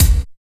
97 KICK 2 -L; Phat & Phunky; East West

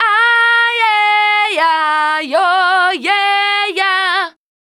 VOC F, Dance Vocals, FEMALE

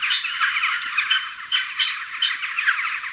Campfire Birds; outdoor; Camping

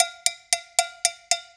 6-8 Cowbell, Perc Loops

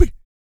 VOC PERC, Vocal Planet Jazz FX BASS PERC

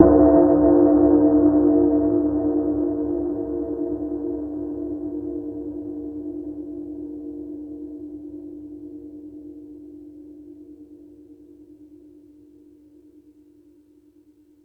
Tool Kit, Drum Machines

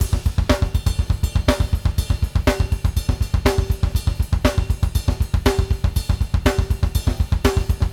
+ CYM, Drumworks

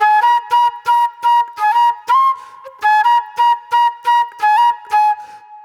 Flute Noir

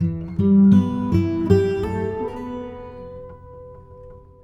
Beach La La, Acoustic String; Sonic Foundry (Sony Creative Software); Friendly Fire

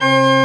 CHRCH, CHURCH ORGAN; Roland - LA Composer vol. 1

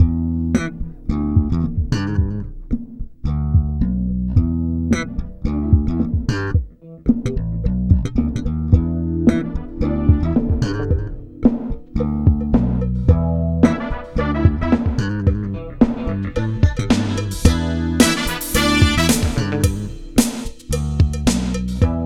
SLAP, Bass Grooves; USB Soundscan vol.30; AKAI

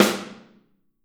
Snare OH; Snare OH All; Drums Percussion

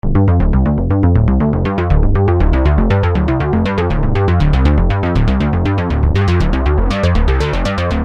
Syn Bass 120 G, Techno Synth Loops; Sonic Foundry (Sony Creative Software); Signals I

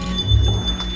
ting ting ting

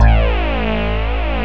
DIGI BASS; DIGI BS

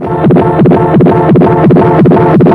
Lo Tek Impact, Weird; Industrial

